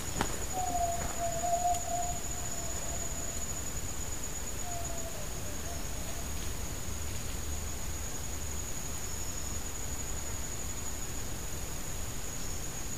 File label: Yellow legged tinamou